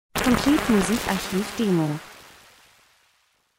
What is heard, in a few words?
transition; Transition & Movement - Wasser Spash tief fliessend